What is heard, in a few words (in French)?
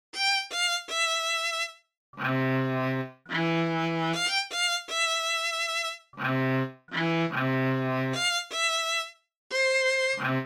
Violon